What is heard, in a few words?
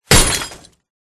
crowbar metal impact hit car glass; silverplatter audio